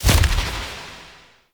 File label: Phase Through Impact; Enemy; Abilities; Characters; Circade